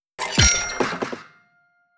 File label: Painting Sold; Affect